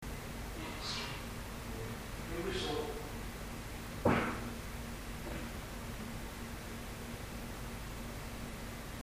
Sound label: loud bang